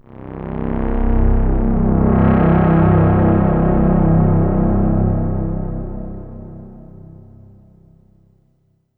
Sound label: Korg 01W Tidal Wave C1